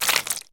Sound Sign Blood; peace death 2017 pc

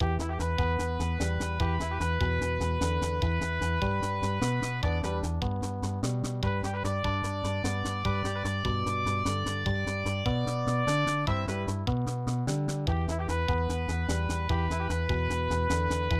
Battle; Liberty or Death; SNES

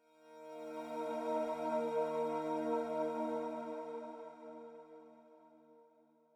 Calm Pad; Super Mario Maker 2

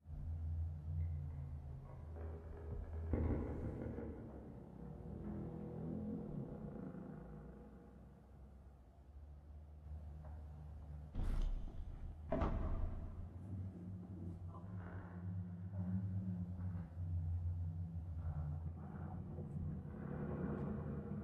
Ambient Hotel; silent hill homecoming 2008 gamerip pc ps3 x360

